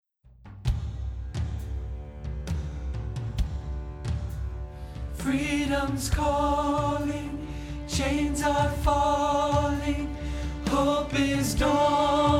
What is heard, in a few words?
tenor rehearsal; choir